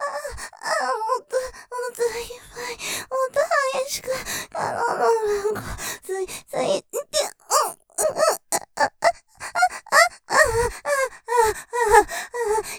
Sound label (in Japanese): 喘ぎ◆もっといっぱい、もっと激しく突いて！◆とろとろマシマシ; ステレオ